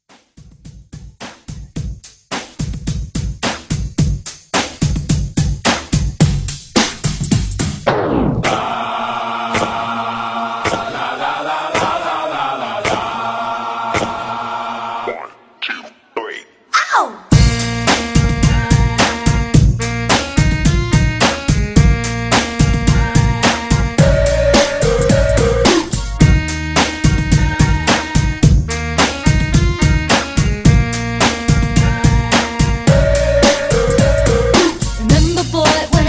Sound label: Throwback Clap Acapella Drop